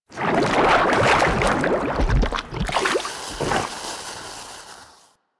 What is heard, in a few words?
Landscape Coolant Bubble; ambience; props; one offs; Warframe; Venus Open World